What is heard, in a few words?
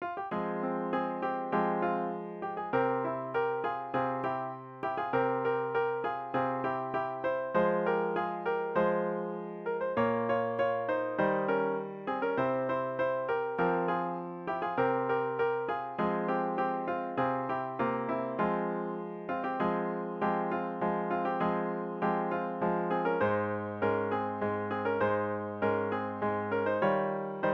midi